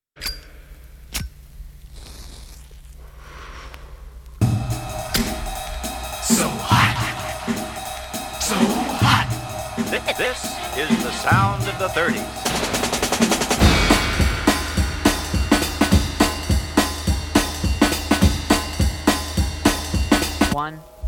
Swing Set Fast Dance

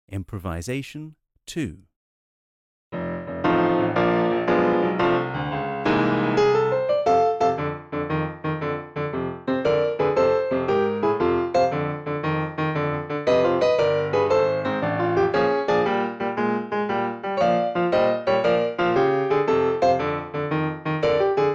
Rhythm B. Improvisation, tap

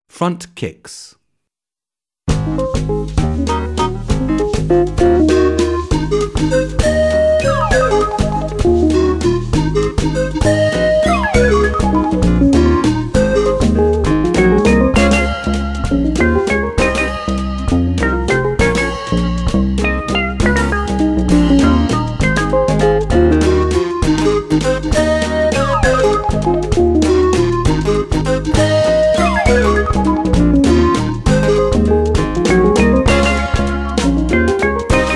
Front Kicks; Modern Exam – Grade 2

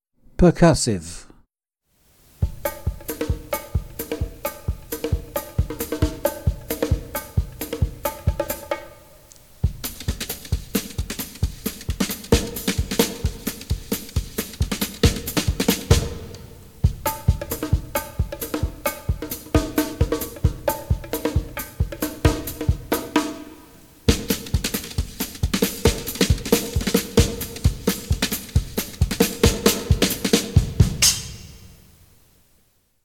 Percussive, tap

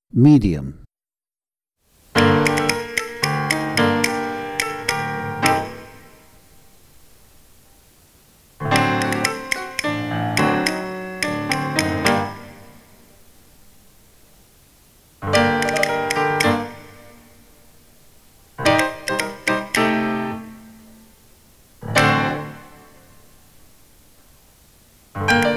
Rhythmic Response Medium, tap

